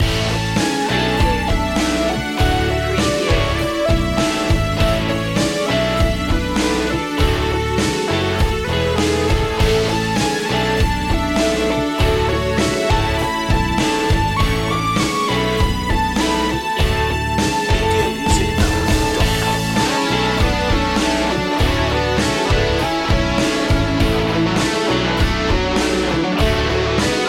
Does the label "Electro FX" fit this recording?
No